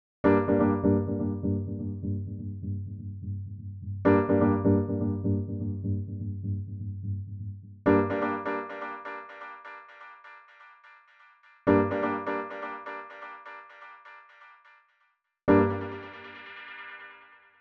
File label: Piano, Delay; Uranus Delay; RobotPlanet by Joachip